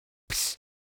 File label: flash bulb